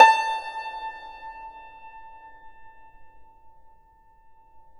YANGCHIN, A4; INIS - Opium

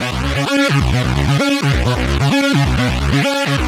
NRG Bass, Trance

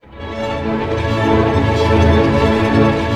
ARP A, STR ARP MAJ; Sound On Sound - Peter Siedleczek's Orchestra

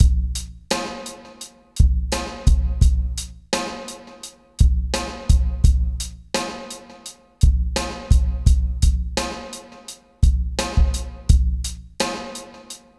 FUNK, Funky Xtreams; INIS

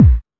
Techno E; EMU XL-7

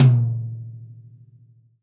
TD-20 Tom A, Roland TD-20 Toms

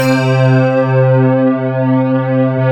SY99; POLYDOR; Rubber Chicken Software Sample Bank

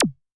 ET MooR M, Tom, Yamaha DTXpress-II

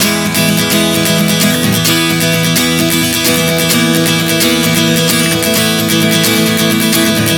E 130 bpm, acoustic guitar chord progressions

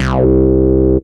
Bass Note C

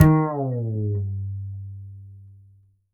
Upright Acoustic Bass; Larry Seyer; SWITCHABLE POSITIONS

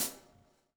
SLICK, Backbeat

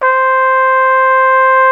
CORNET C4; Greytsounds VOL-1

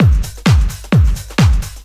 techno, DRUMLOOPS

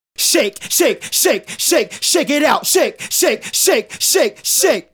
Male Solo, Hip-Hop and RnB Vocals

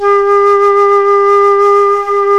FLUTE & BELL; OMI - Universe of Sounds

